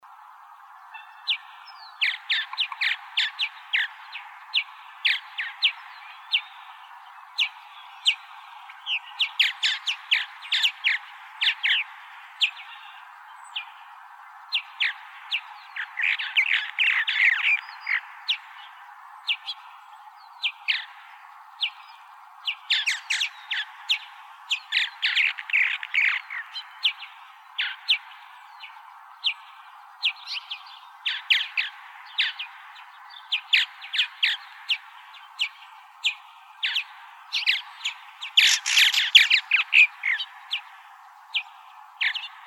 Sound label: Larks Swallows; AZFO; deviche